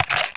reload

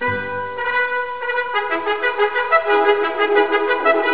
secret fun; Gf landworld

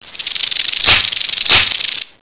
scorp alert; gearbox; lg scorp

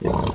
voltigore run grunt; svencoop

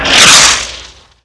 rocket

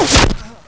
pain shot; firearms

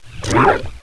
pit drone attack spike; svencoop; sectore

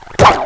pit drone melee attack; svencoop

